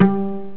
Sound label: pluck